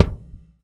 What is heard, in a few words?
Bloom, Kicks; Airwindows